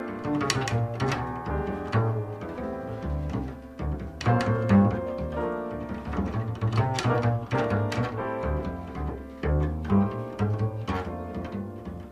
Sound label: solo, bass